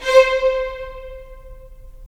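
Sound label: strings violins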